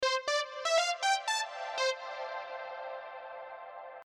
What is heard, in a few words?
SIDECHAIN REVERB WET